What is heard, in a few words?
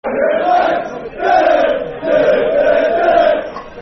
Always Shit On A Tesco Carrier Bag, Football Chants; Wolves; wolverhampton wanderers; fanchants